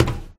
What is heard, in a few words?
footstep metal low walk; MONO; ProSoundCollection v1 3